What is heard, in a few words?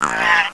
injur, pupae; svencoop